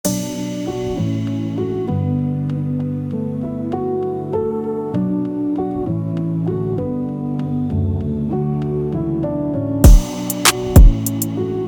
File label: Melodic Type Beat